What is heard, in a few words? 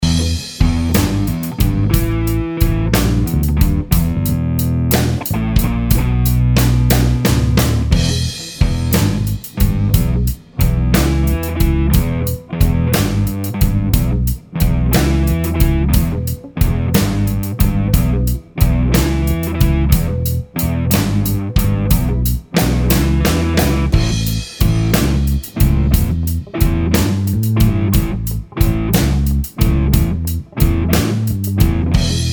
slide backing, guitar